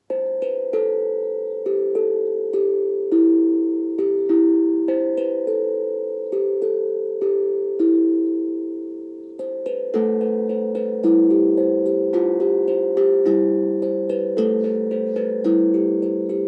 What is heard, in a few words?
Tang drum